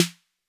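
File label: Roland 808 snare drum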